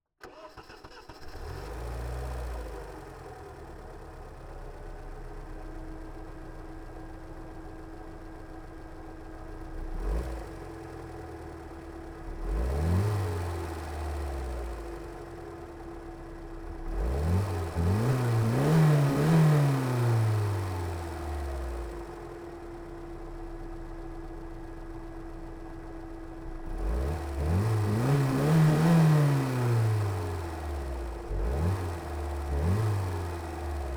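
Lada, Niva, Onboard, Start, Idle, Blips, Off, Engine, Center; 1996; MKH8020; Pole Position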